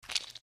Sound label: MedicalScientific; Soundfx III